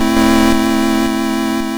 YM Chord E; ChipShop Deluxe; ChipShop Hits

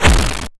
YM Noise; NOISES; ChipShop Deluxe; ChipShop Hits

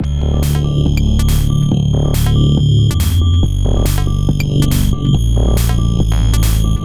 Combo D, 140 BPM; CHIPSHOP DELUXE; CHIPSHOP LOOPS

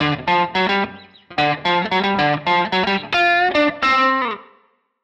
Guitar Line, hiphop; musicradar

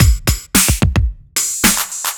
Beat 110bpm; musicradar

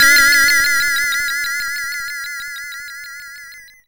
YM Chord C#; ChipShop Deluxe; ChipShop Hits

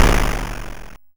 Snare, YM, CHIPSHOP HITS DRUMS; ChipShop Deluxe

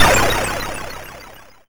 FX Shock; SFX; YM; CHIPSHOP DELUXE; CHIPSHOP HITS

